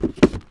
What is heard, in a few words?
item cardboard pickup